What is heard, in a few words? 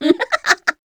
succubus laugh spin; queen girl